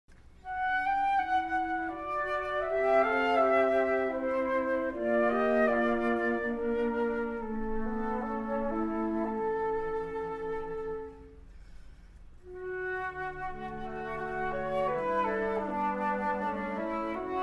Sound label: Flute Quartet